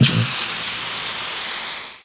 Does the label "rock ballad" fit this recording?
No